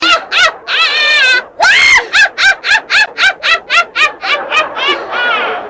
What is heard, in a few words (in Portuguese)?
risada bruxa